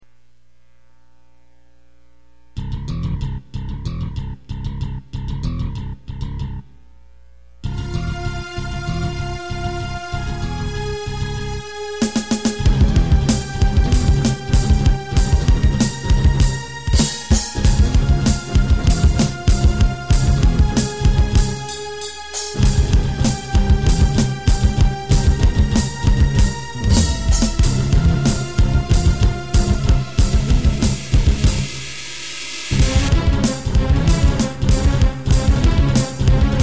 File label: revolted metal